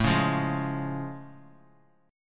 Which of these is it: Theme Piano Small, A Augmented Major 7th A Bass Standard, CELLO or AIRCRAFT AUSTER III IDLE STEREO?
A Augmented Major 7th A Bass Standard